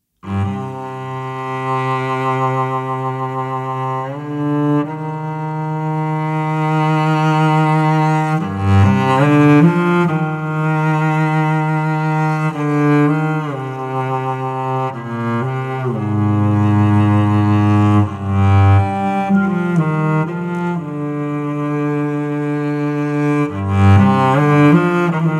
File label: Taknavaziye Cello (Cello Solo)